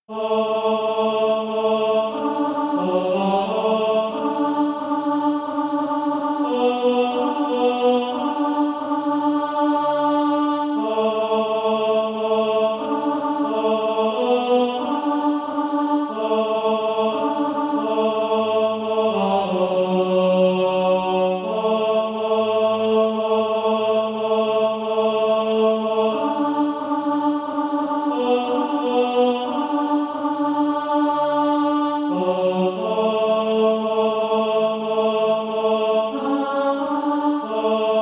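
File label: tenor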